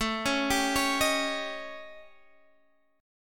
A 7th Sharp 11th; A7#11; arpeggiated

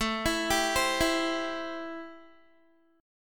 A-Minor 7th A Guitar; 16,19,19,17,16,x; arpeggiated; Am7

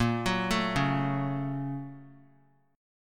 A sharp Minor Major 7th Sharp 5th A sharp; A#mM7#5 arpeggiated; JGuitar

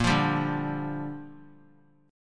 A sharp 6th; strummed; JGuitar

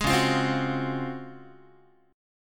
Db Major 11th, Guitar; strummed

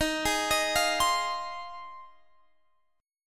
F Minor 7th, Eb

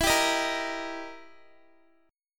E Minor Major 7th Flat 5th, E, down, Guitar; strummed; JGuitar

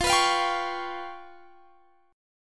G 7th Flat 5th

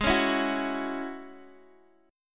Ab 7th Suspended 4th Sharp 5th Ab Guitar DADGAD; x,x,6,6,7,4

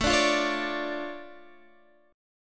D Minor Major 7th, A, guitar

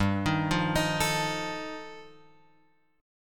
G 7th Suspended 2nd Sharp 5th G chord; G7sus2#5 Chord {3 6 3 X 4 5} arpeggiated

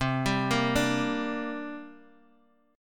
C 7th Suspended 2nd

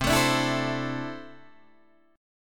C 6th Add 9th C down Guitar Open D

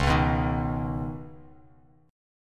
C sharp 7th Suspended 2nd, Bass Standard; C#7sus2; strummed; JGuitar

